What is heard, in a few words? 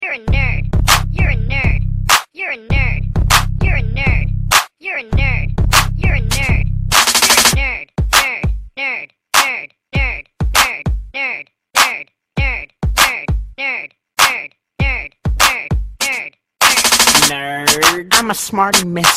Nerd Ringtone